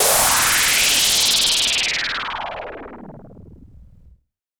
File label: BassStation